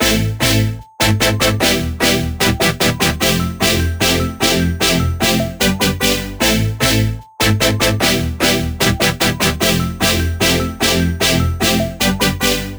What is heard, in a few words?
Chord Loop, 150 BPM A Min, Synth; Future Bass; Drop Loops; Heater